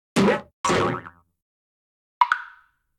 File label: se game fail